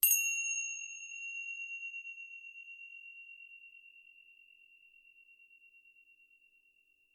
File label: tibcymb high sound